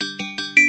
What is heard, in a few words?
xiaomi 12 pro notification ringtone